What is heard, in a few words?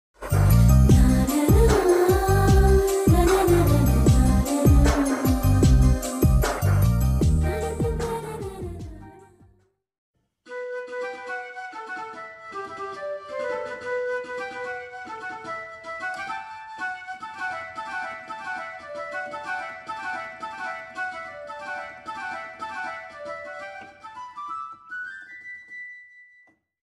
romantic bgm; sushanth; meenakshi chaudhury; vennela kishor